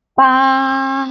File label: baaaah